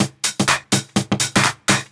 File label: Dance Grooves